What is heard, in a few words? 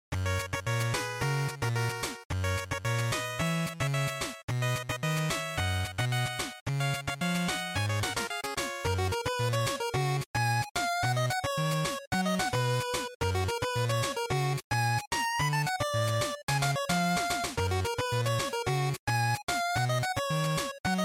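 Game Boy Action Stage, bgm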